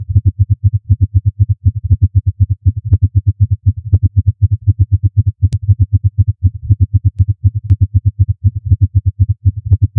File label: womb like electronic hear; consistent